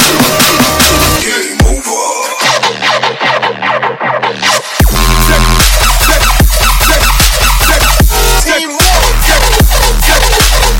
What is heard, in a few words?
game over